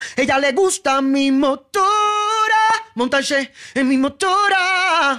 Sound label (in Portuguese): motora